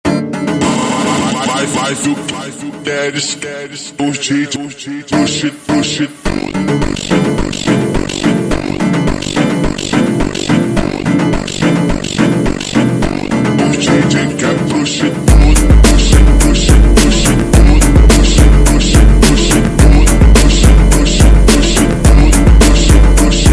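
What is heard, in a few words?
ultra Slowed, phonk music; funk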